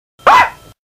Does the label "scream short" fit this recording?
Yes